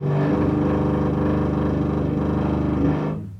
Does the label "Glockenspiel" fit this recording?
No